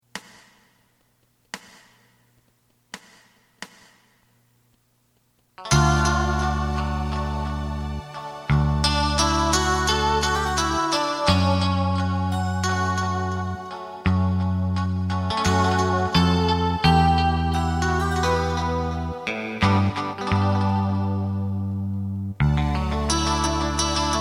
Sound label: drum